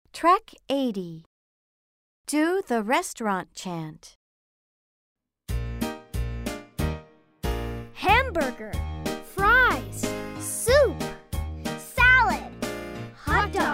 the restaurant chant

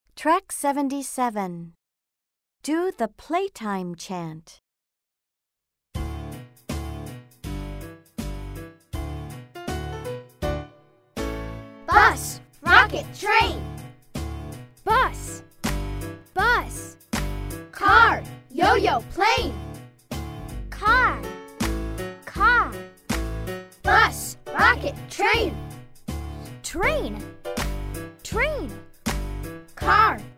the playtime chant

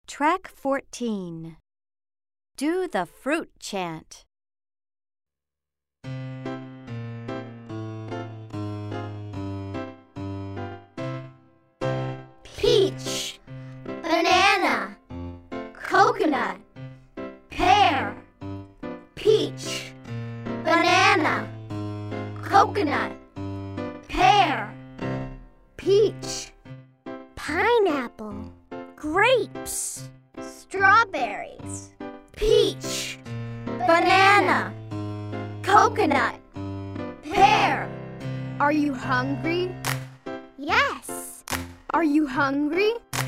Fruit Chant slow

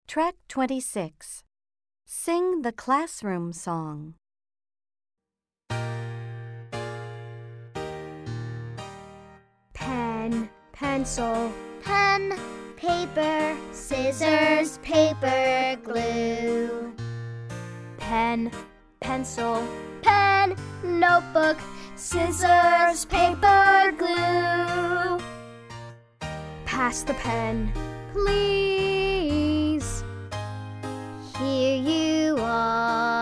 Sing the classroom Song

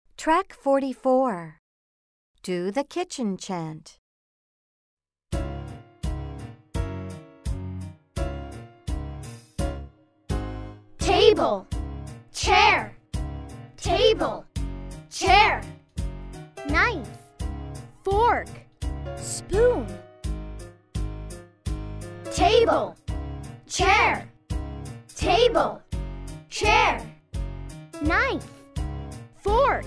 the kitchen chant

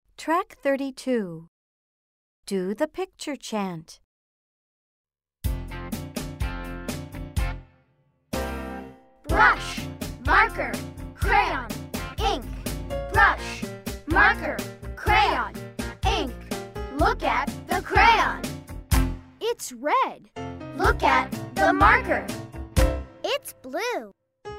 the picture chant

